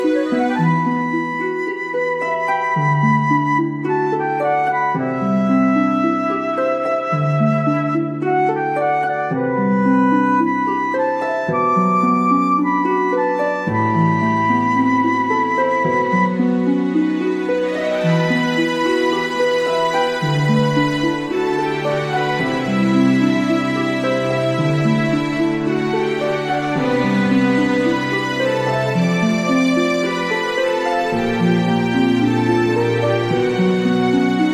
BGM

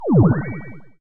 Heal

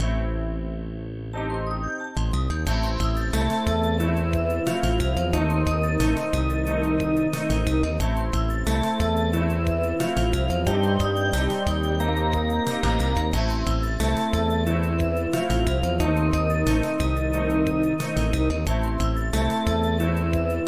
Dungeon, bgm

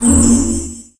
craftstart

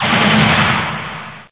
Fire

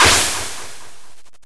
Absorb

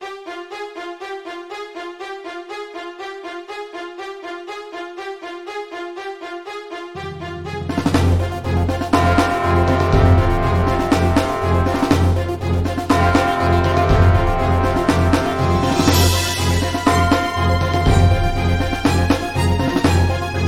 Dungeon, bgm

